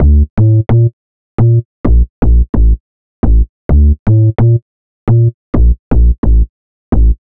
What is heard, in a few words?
Bass Loop 130 Dm; Bass Loops 130bpm; Downtown House